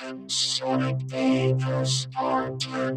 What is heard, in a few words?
old synths; Rubber Chicken Software Sample Bank